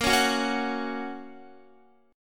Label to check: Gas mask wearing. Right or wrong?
Wrong